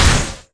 Impact Arcane; smite; god merlin

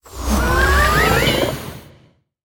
Buildup; Smite; god Kumbhakarna

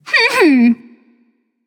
tongue, voicepack; smite; pele

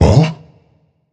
nv surprised, vox; smite; voicepack; vulcan

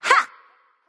grunt attack; smite; scylla

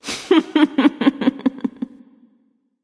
laugh; smite; neith

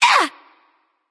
grunt hit; smite; skadi

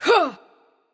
grunt attack; Smite; athena

